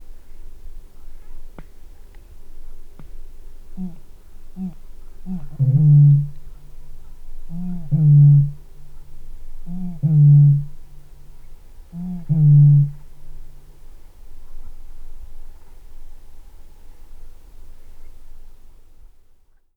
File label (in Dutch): Roerdomp